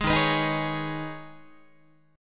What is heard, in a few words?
Eb 7th Suspended 4th Sharp 5th Eb, Guitar; strummed; chord